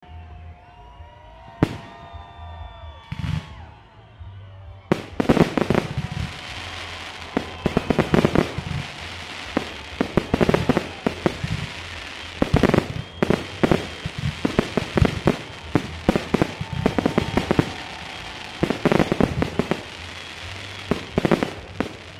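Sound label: faerverk, fireworks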